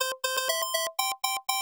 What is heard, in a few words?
Arcade Victory; wing commander ibm pc at